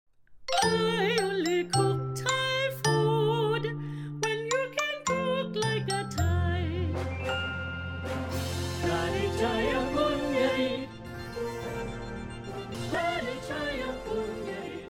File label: Theme Music